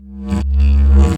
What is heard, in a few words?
Didgeridoo Kit Samples